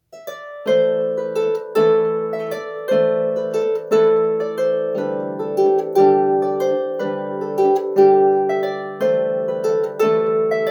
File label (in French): Harpe; marche funebre du roi Brian Boru